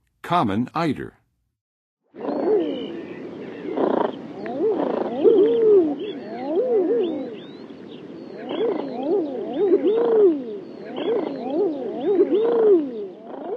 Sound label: Common Eider; North America; Western USA; Western USA Songbirds